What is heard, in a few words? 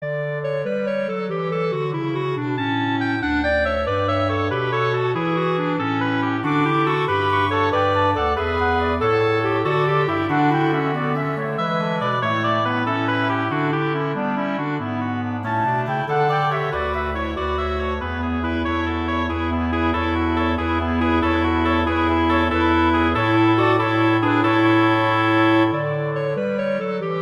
Gigue; Pachelbel; Canon